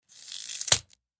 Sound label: Snap Celery Creak, kitchens